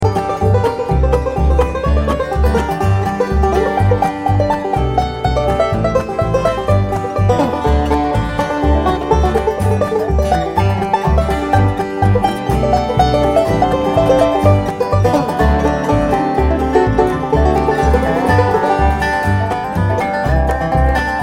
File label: banjo